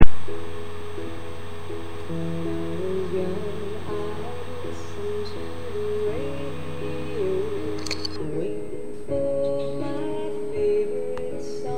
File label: record; CEC X8